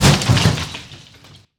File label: wood crate